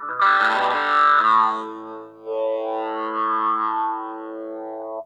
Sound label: SLOWDOWN, Funk Guitar; Zero G - Funk Guitar